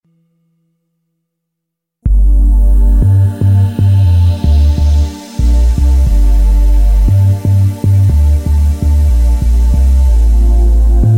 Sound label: DEEP BASS E PAD